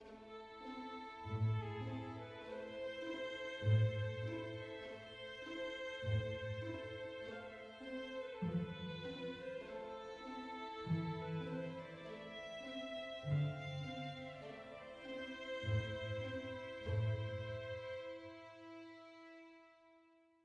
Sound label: adventure soft; Quivr; PS4 Windows gamerip 2016